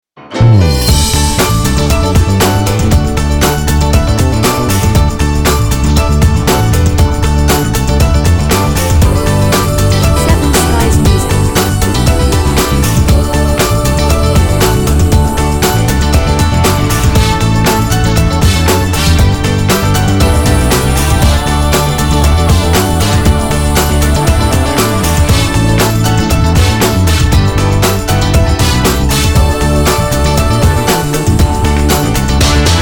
Funky Opening

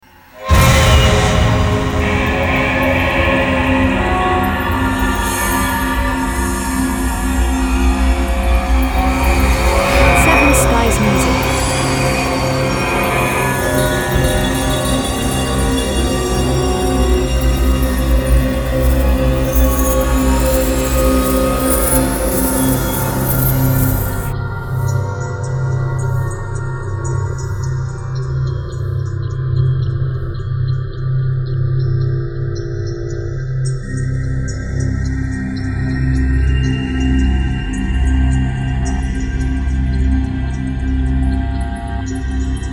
Ambient Dark Film Series

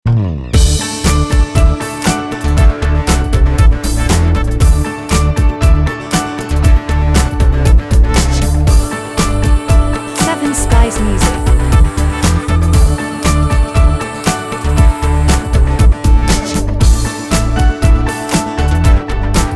Alternative Opening, no vocals

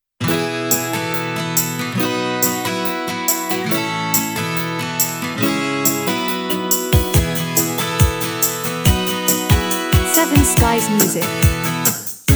Cheerful Promo

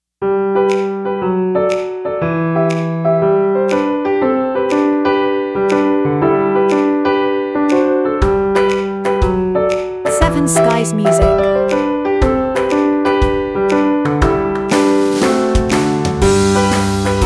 Cheerful Piano Ad

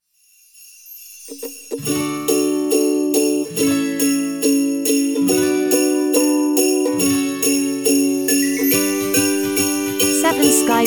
Christmas Ukulele Instrumental

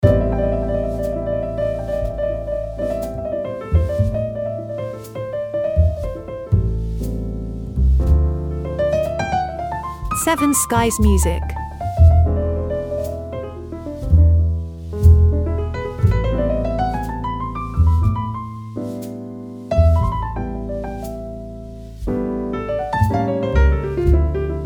Lounge style jazz trio

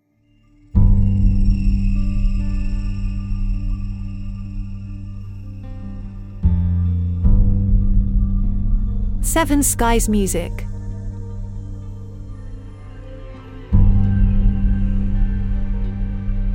Space Night Ambience

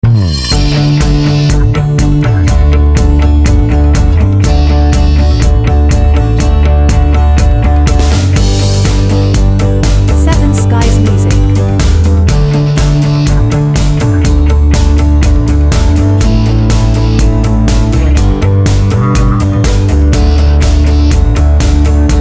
Rock Opening

